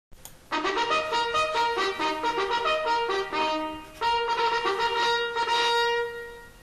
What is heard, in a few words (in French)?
Appel art, Sonneries reglementaires